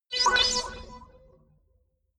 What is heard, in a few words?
notification accept danger; signale